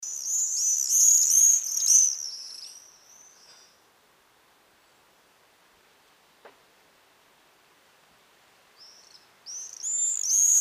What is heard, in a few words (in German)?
Mauersegler; Vogel